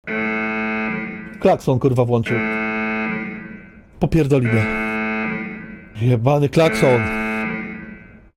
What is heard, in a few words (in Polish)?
klakson